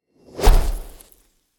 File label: Spell Shoot